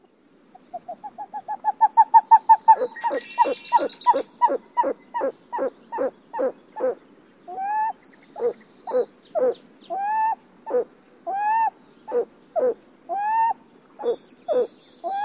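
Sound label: pinyon jay song with extended braying at end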